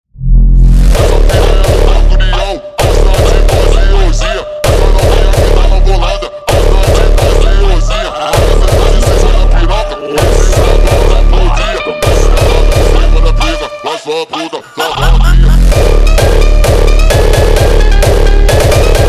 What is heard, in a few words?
jumpstyle piano